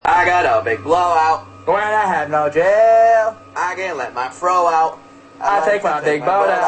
singing

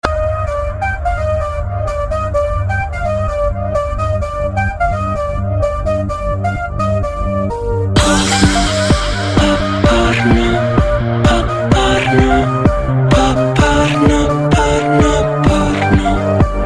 Electro pop

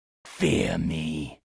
Fiddle

